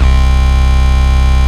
Basses C; Vengeance Samples

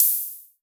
Open Hihats, Cymbals; Vengeance Samples

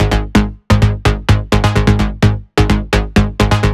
Bass 128BPM; VFX2 Demosong

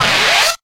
VFX Short Fills & Reverse

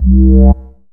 Offbeat Bass C; Vengeance Samples

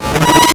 Arcade Riffs

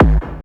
Bassdrums Hard Kicks; Vengeance Samples

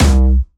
C2, Jumpstyle Kick; Bassdrums; Jumpstyle Multis; vengeance essential club sounds-3; VEC3 Bassdrums

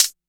Open Hihat; Vengeance Samples; VEE Cymbals

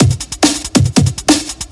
Breakbeat; Vengeance Samples; VEC2 Loops

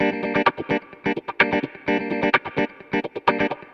Electric Guitar Kit G min, 128BPM; Vengeance Samples; Special Sounds

